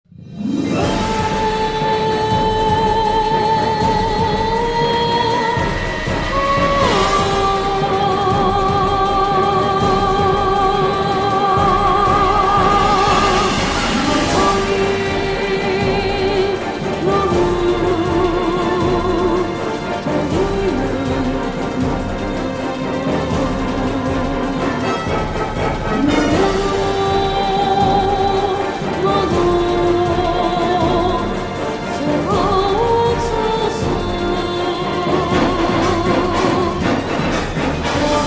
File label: Exhausted Vocal Mix; drakengard 3 dlc ps3 gamerip 2014